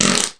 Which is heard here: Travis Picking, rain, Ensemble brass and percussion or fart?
fart